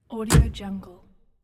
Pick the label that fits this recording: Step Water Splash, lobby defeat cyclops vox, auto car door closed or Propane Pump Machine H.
auto car door closed